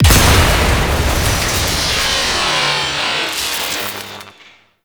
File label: Turret Explosion B; Warframe; Ambience; Grineer Galleon; Vor Galleon Fight